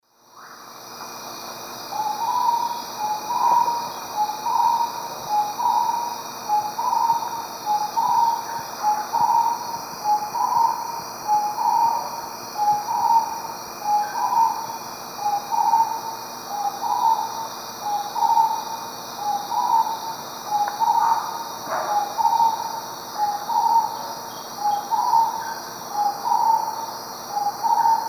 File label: Odontophorus stellatus, song; S. America; Eastern Lowlands